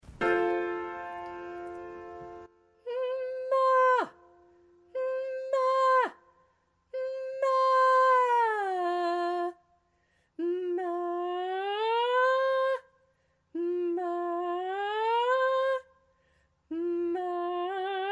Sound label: Blocky With Hmma; vft practice plans